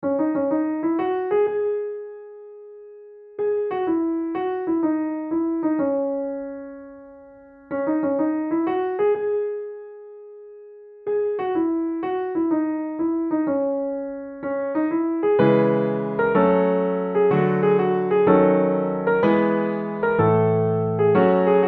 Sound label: theme music solo, piano